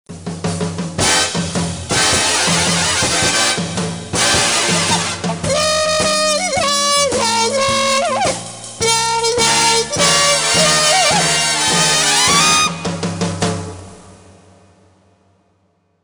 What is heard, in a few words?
STRIPPER BED (With Horn part), JINGLES; for KQV